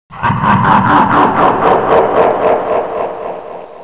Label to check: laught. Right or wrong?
Right